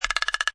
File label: Cartoon Fast Knocking, Comedy rapid knocking, wood ratt; Comedy/Cartoon; Comedy rapid knocking, wood rattle; Funny Sounds